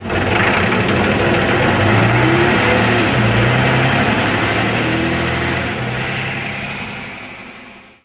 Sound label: leyland national; simutrans; Pak128.Britain-Ex